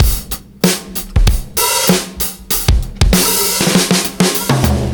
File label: 97 bpm, breaks; Zero-G Beneath the Planet of the Breaks